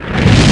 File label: unexplosion